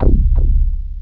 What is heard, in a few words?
Bass